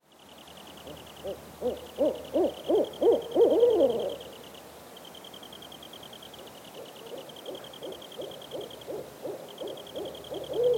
Forest night ambience crickets cicadas and insects